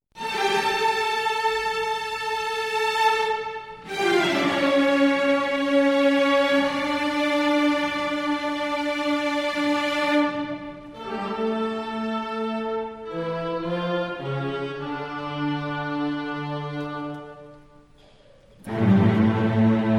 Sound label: Toccata and Fugue in D Minor; All-State Orchestra